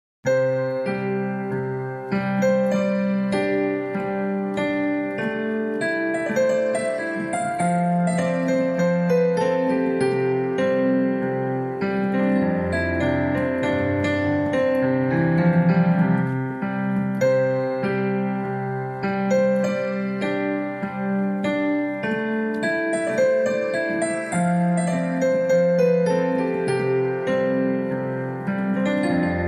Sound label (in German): Klavier